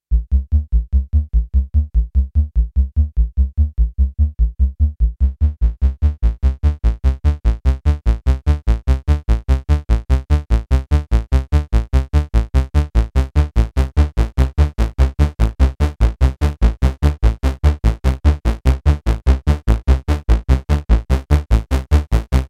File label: Arpeggio